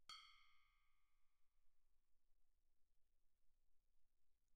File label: splash stick bell pp, suspended cymbals; Percussion